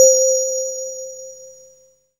SYN K-2000, Roland

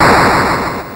Atari Noise Long, Atari 2600

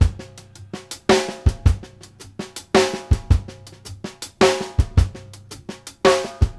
CLOSED H, WALTZ 108; Sampleheads; New York City Drumworks VOL-1; SP WALTZ 108

